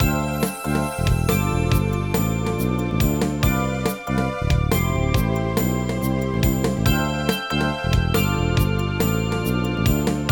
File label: Game Play; bermuda triangle saving the coral; nds